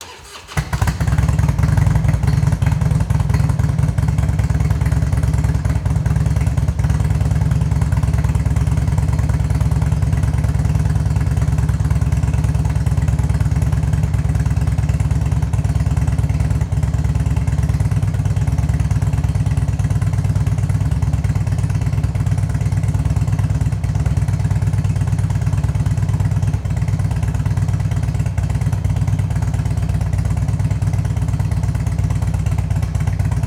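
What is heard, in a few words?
Transportation Motorcycle Harley Davidson Starts Up Idle Shut Off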